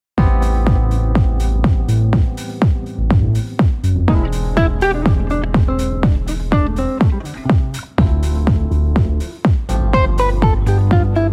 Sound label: Red Guitar house mix